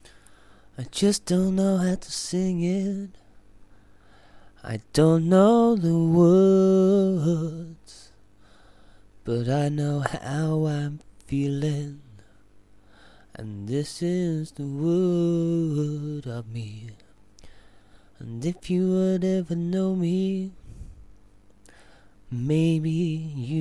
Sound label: singing test